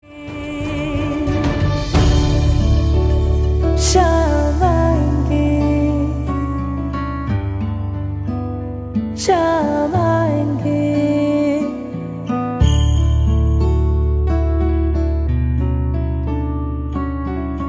Instrumental

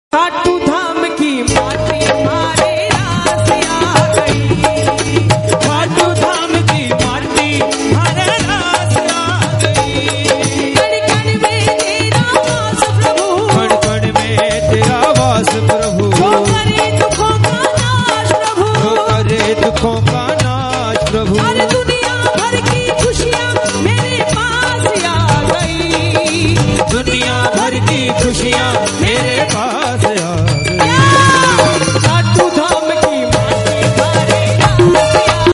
Khatu Shyam Bhajan

